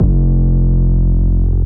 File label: 808 long dirty F; 808s